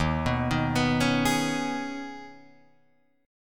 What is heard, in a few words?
Eb 7th Suspended 4th Sharp 5th Guitar Open F, Eb7sus4#5 chord; arpeggiated; 3,6,3,6,4,3; JGuitar